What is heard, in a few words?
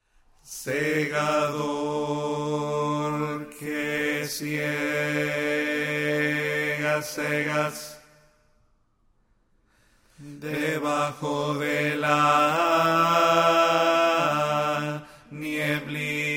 bass